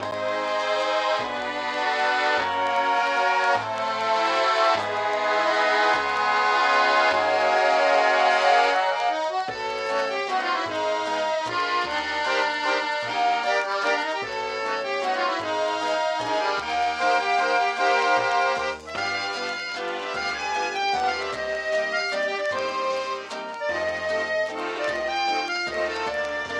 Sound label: Accordion Incidental